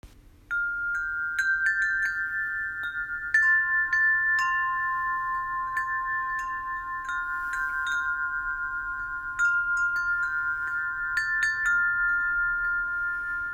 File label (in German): Klangspiel Amazing Grace